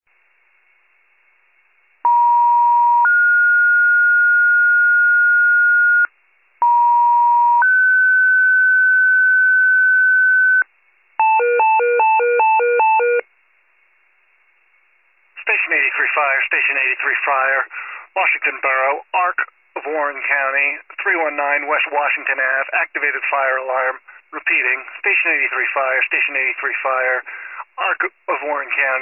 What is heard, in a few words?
FIRE pager alert